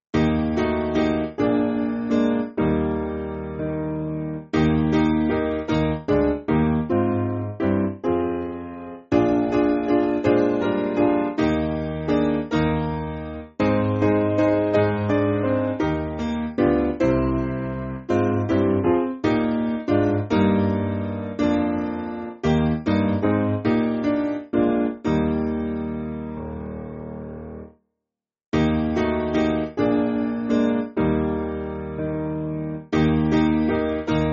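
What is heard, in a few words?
Piano, Free Music Backing Files for Churches; Eb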